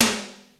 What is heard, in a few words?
Rim tom2, Roland JD-990; Drum Samples